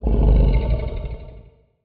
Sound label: Feline Quiet Short Roar; Monster Sounds; TheDeclineOfWarriors; Monster Sounds Volume II